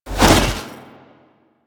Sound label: Trooper Melee Whoosh; Warframe; DE; Sentients